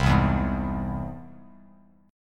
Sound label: C Augmented down Bass Standard; strummed; JGuitar